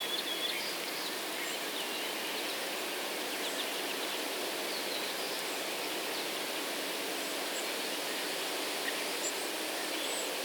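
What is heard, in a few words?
ambience bird wind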